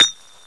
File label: glass bottle impact hard; cstrike